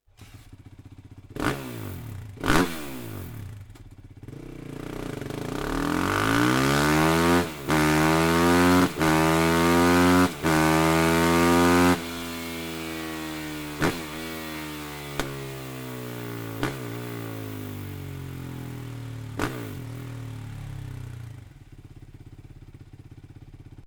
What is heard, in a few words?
akrapovic slip on exhaust suzuki rmz250